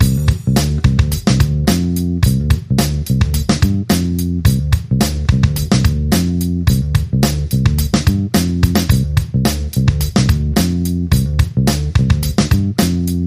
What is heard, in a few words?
Cool Jams 6, Bass and Drums